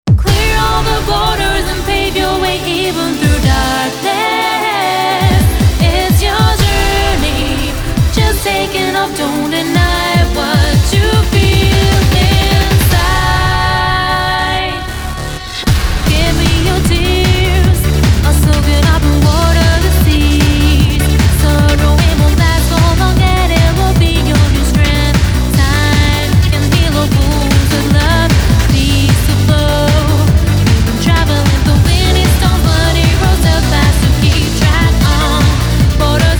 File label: flourish; DanceDanceRevolution X; arcade; PS2